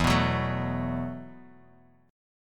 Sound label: E Suspended 2nd Suspended 4th E, Bass Standard; strummed; JGuitar